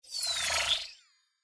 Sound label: mini reel spin; Fondant Favourites StarTrail; Eyecon